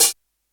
Roland U110 Rock Drums F#1

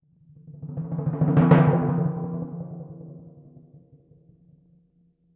Crescendo-decrescendo E, Timpani; PowerFX; Downtown Orchestra

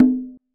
Congas Conga open; Best Service; Festa Latina VOL-1; Single-Hits

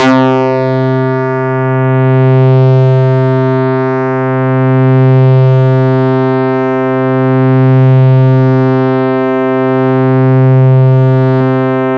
AnalogBass c1, Yamaha YX81Z

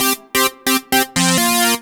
2 STEP 131 SYN, House Side Of 2 Step; USB Soundscan vol.51; AKAI

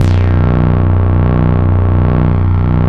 FILT BASS; Big Beat Samples & More

